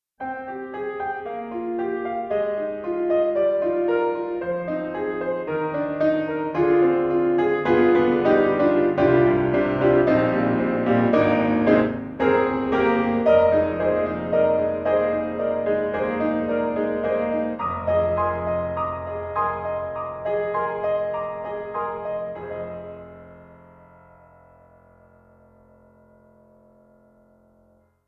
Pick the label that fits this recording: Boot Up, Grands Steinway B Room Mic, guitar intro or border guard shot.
Grands Steinway B Room Mic